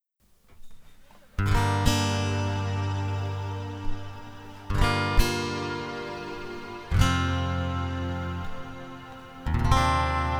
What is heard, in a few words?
Short Acoustic bit, guitars, washburn